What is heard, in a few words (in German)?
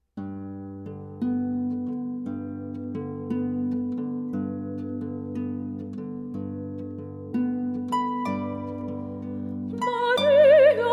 Maria Wiegenlied